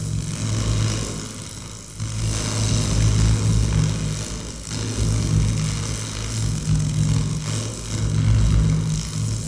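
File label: arc loop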